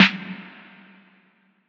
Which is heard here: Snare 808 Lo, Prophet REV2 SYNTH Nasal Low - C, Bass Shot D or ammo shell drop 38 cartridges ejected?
Snare 808 Lo